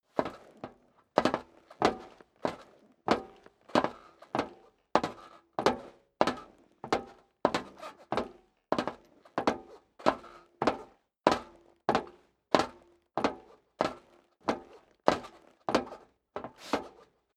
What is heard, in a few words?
step boots metal dry downstairs sequence; schritte